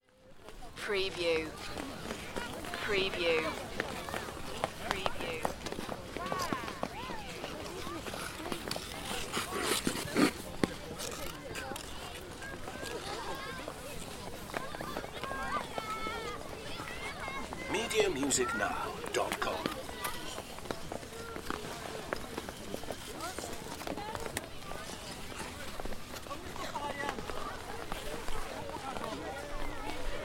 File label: Crowd, Ice Skating Park